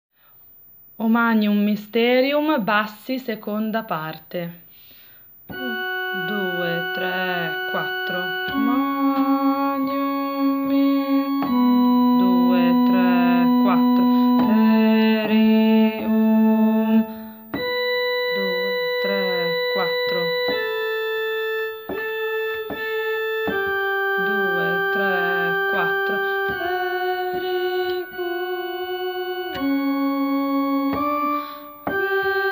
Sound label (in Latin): O Magnum Mysterium bassi